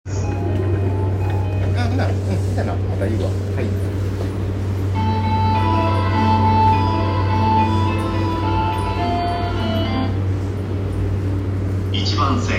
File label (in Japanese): JR駒込CW